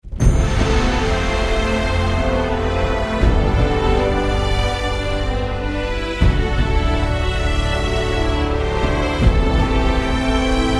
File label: Dramatic Positive; Marlow Briggs and the Mask of Death